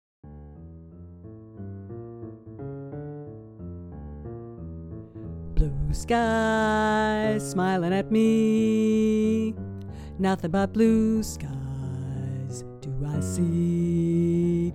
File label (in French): Basse